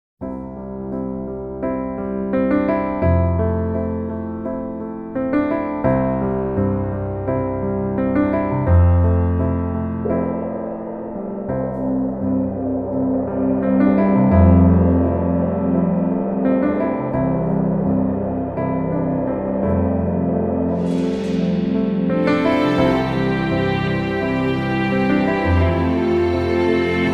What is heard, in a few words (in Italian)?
versione karaoke